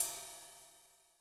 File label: Sizzler, Cymbal; Yamaha DTXpress-II